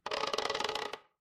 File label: door creak